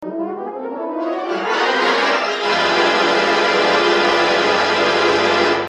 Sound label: terror organ; Halloween